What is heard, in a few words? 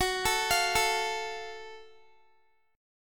Gb Minor Major 7th Gb down Guitar; arpeggiated